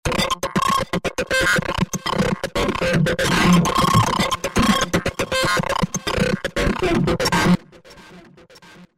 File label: Nano FM, Roland V-Synth; Factory Patches